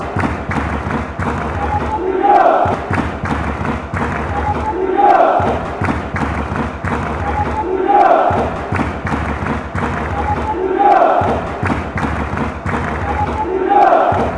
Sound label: chant; olympiacos